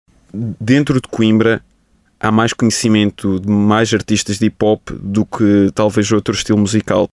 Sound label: Hip Hop